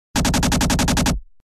Powerful machine gun burst

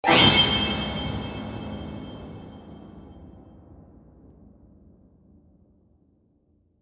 Scary horror jumpscare

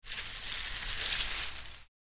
Beaded door curtain sway rattle movement; blinds

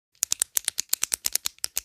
Pen office clicking multiple times

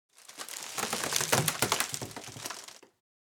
Wood under pressure before snapping

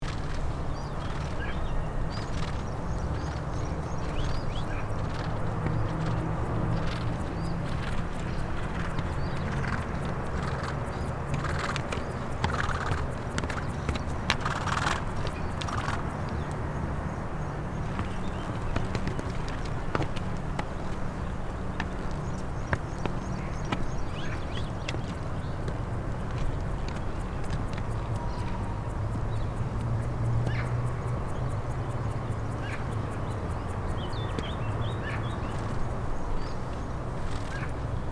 Park atmosphere quiet day with galloping horses

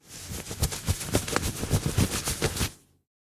Clothes jacket running movements

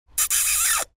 Kiss long female fifty year old

